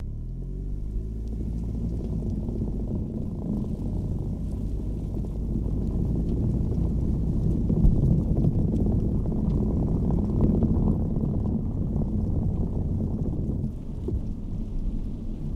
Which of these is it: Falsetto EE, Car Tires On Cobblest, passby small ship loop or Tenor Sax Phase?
Car Tires On Cobblest